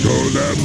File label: golem